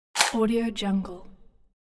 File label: camera shutter